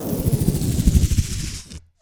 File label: Balloon, cartoon Foley Fx; musicradar; cartoon caper